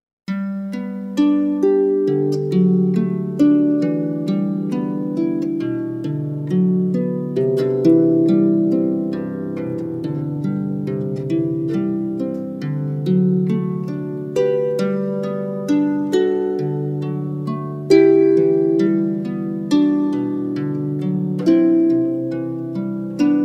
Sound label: Pachelbel Cannon, Harp; Pachelbel's Canon in D